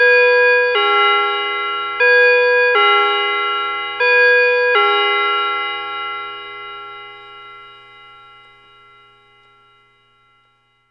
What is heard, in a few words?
bim bam, chimes